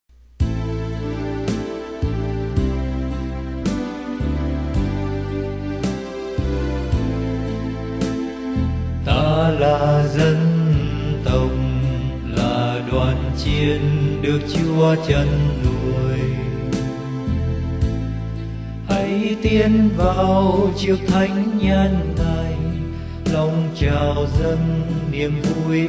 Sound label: BASS